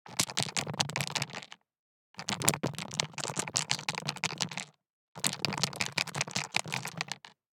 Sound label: DICE in Leather Cup, Shake, 3 Three Dice; Samples; ArticulatedSounds; DICE Sfx Party (Extended)